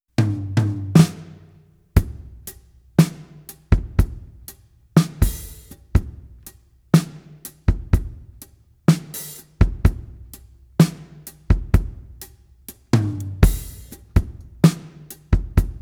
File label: Phase test original